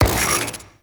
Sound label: Vial Ability Radiation Shatter; Warframe; Warframes; Alchemist; Elements